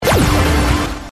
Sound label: fly; Helloween; slottiger